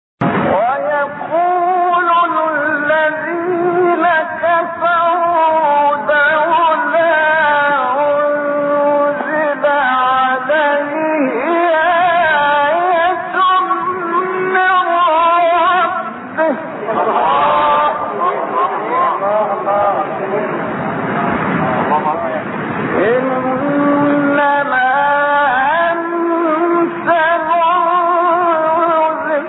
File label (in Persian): تلاوت زیبای آیه سوره مبارکه رعد; محمد رفعت